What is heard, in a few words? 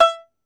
Tenor Banjo, Plucked Strings